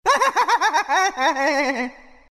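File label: laugh, Chinese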